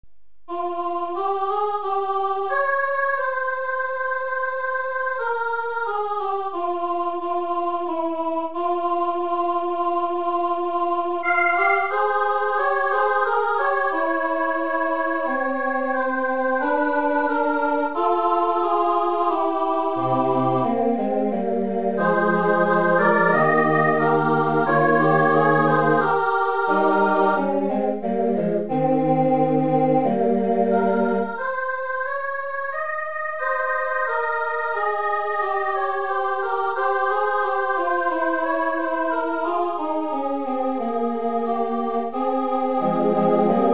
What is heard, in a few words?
agnus dei chorus